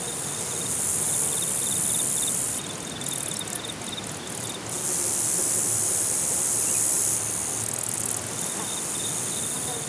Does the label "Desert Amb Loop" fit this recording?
Yes